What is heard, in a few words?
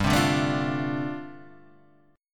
G 9th Suspended 4th